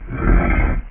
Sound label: TRACKS